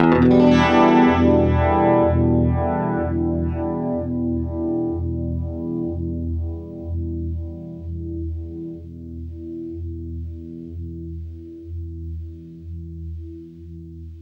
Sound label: UNIVIB EM7, guitar; East West; Steve Stevens Guitar Samples Collection